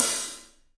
Paiste hat, drums; 3D Sound

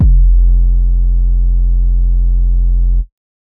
Dusty 808 F, hip-hop drum kit

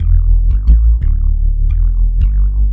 Synth Bass; Bass X

